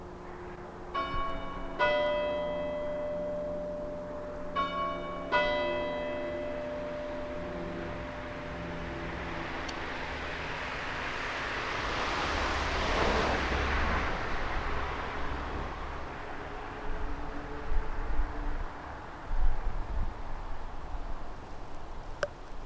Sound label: Chime; Clandon